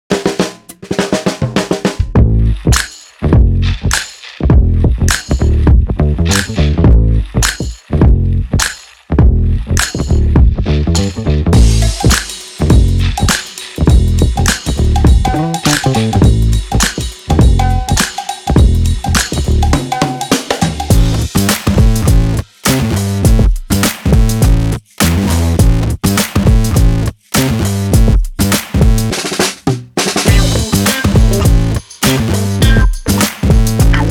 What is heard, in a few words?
music